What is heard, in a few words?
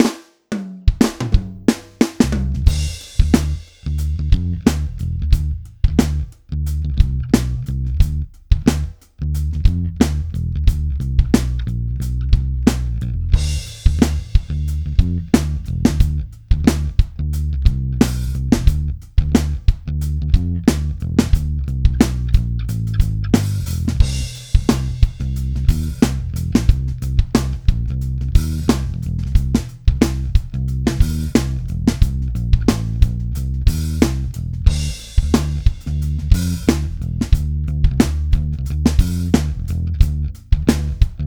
Funky Rave